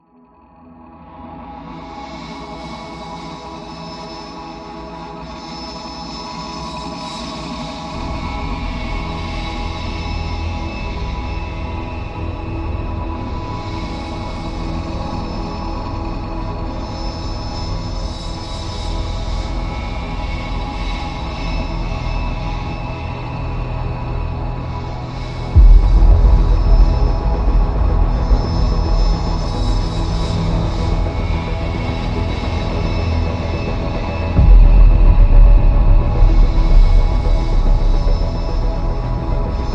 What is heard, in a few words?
Ambient Horror